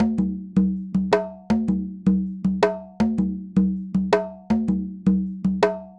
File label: Conga, Latin Percussion